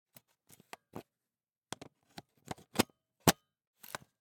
Camera, Pentax KS2, DSLR, mechanical, removing lens, replacing lens, twist, unlock, lock, XY; Eiravaein Works; Vaeyan IV